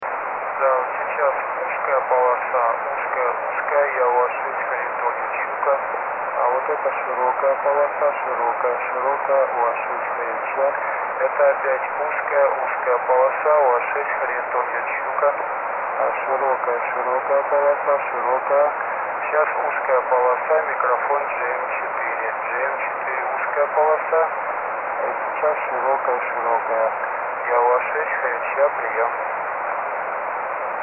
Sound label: ssb qrm